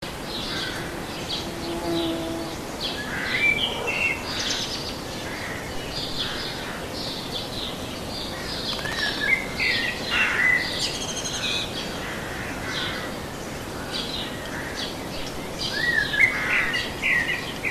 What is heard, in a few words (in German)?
voegel